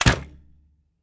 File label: Arrow Release, Longbow; SteamVR; InteractionSystem